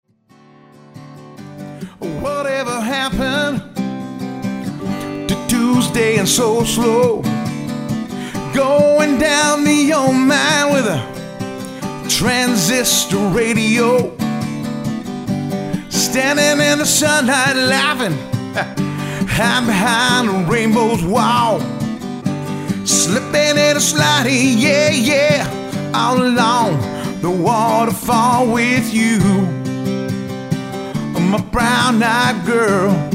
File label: acoustic